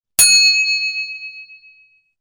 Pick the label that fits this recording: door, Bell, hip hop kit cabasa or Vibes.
Bell